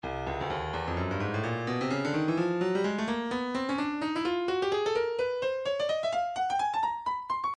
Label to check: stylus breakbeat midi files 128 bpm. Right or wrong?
Right